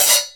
low treble open high hat sample c sharp key, Royality free open hat tuned to the C# note.